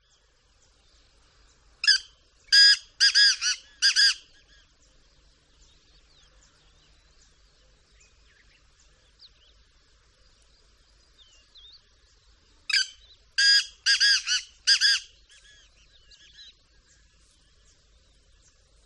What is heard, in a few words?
Bird Black Francolin; audio hero